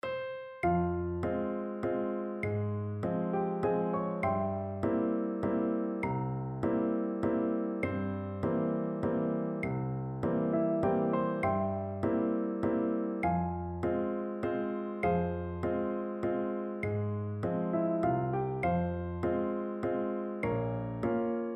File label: audio click